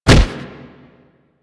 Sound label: Fighting Blocked Impact; Warframe; Weapons